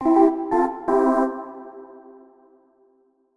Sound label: Videogame Victory Combat Jingle